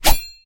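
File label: sharp knife blade hitting a wooden board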